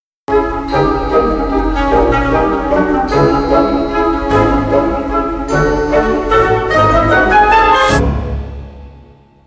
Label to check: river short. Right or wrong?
Wrong